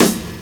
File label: SD, snare, 70 s Breakbeats